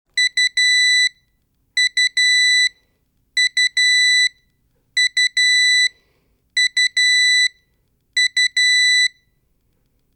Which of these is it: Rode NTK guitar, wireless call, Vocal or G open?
wireless call